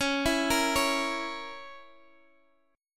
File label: Db Minor 6th Db down Guitar Open D